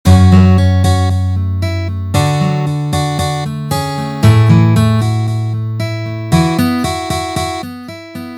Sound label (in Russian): Fingerstajl